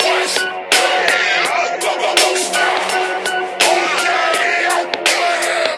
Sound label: Growl, ringtones; Pantech Breakout